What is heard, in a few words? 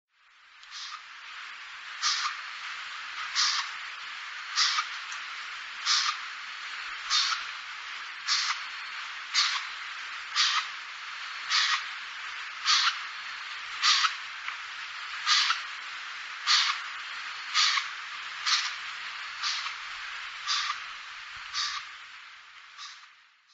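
Oriental Scops Owl; koe; hirosaki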